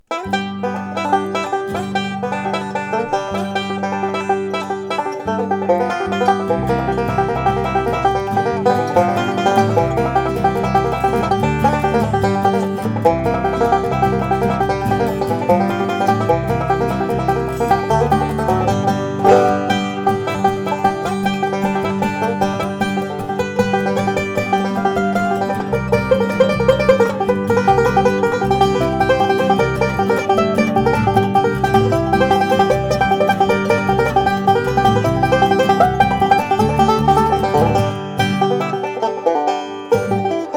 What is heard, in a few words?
pick along, banjo